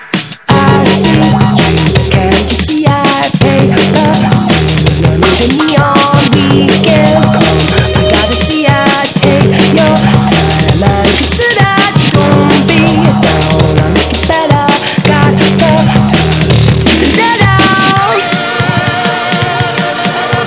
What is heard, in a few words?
Breakbeat